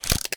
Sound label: shutter; saiyku [rby] instafade